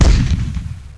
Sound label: cannon; THEXDER